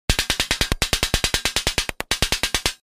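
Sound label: Uzi submachine gun with silencer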